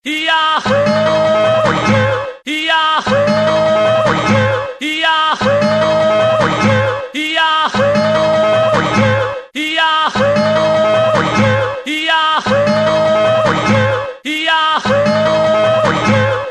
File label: Yodel; Yahoo